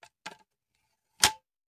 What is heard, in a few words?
Machine Gun Foley; Sound Ideas; Guns